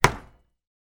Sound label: industrial hammer hit wood decking plank; zapsplat